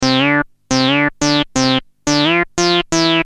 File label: lead, sound design hardware